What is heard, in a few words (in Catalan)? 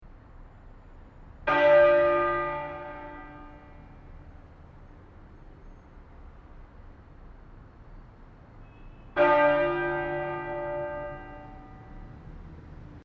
campana